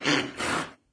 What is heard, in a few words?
Pony Idle Sound